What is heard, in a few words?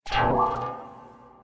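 caution missile; total recoil2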